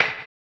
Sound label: HAMBONE, Vocal Blues Soul; Spectrasonics; Planet